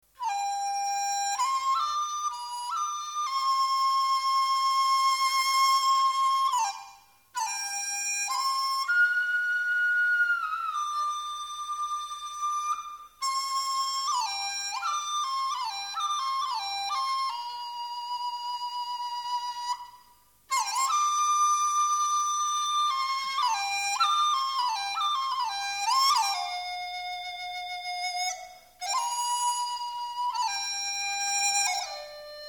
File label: dizi